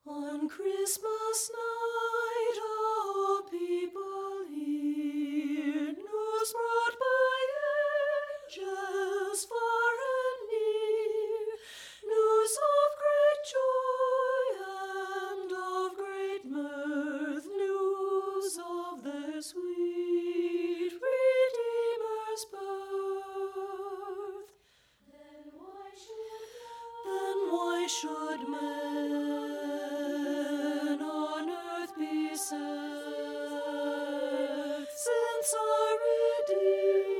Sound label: ALTO; ON CHRISTMAS NIGHT